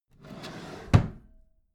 empty drawer smooth close regular

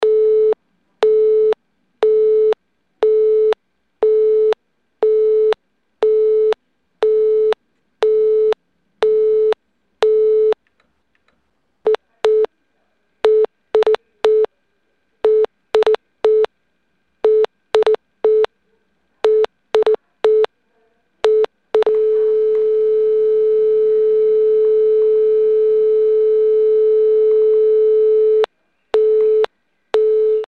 phone old

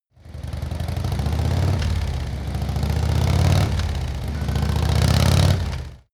motorcycle harley triple gear shift

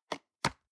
plastic box food small close medium

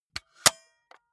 walkman close soft

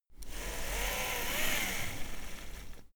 servo move powerful quiet

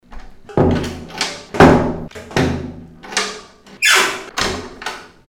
door

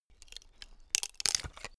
wood stress

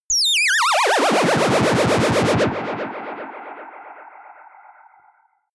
plasma gun ufo

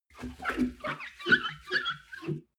clean window paper towel squeak high

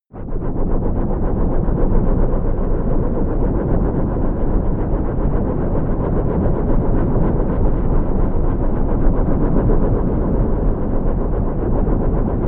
atmosphere rubber band

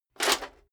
metal plate armor movement single

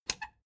plastic button latch squeak click enable fast

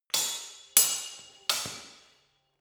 sword hit fast sword triple

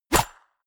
golf ball hit

